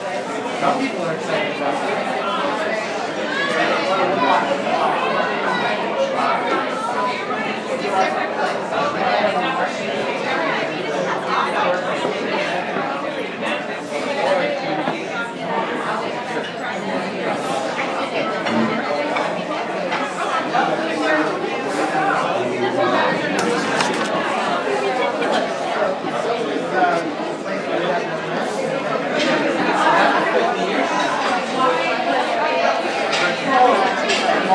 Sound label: ambience; le pain quotidien